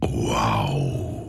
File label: woooow